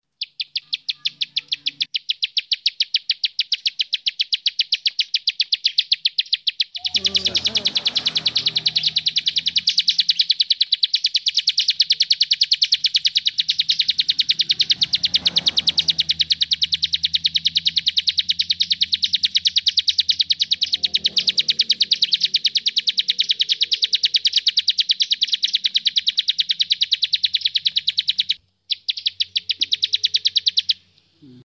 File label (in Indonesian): suara tembakan ciblek sawah